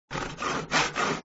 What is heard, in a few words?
change object saw; gardenbloom